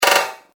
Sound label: Rim Hit Fast Echo; Hit Sound Effects; impact sound effect; Andrew Fleming